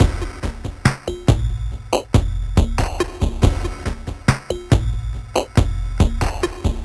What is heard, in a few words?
SloMo Beats, Loops 140 BPM